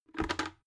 bucket handle use

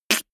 beatbox snare harsh

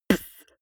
beatbox snare punchy damped

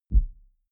sub bass soft

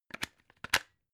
plug plug in clatter

